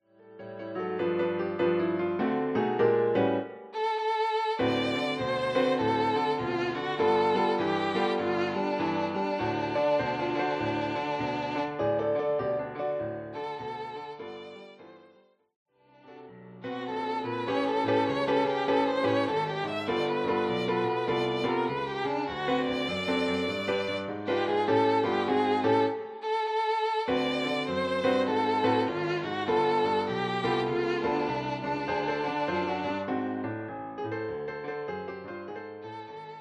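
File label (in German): Violine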